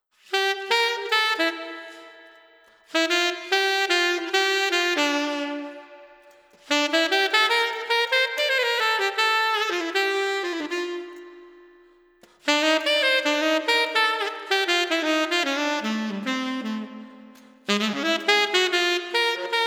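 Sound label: Street Tenor Sax Delightful, 150 bpm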